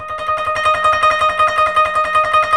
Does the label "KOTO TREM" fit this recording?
Yes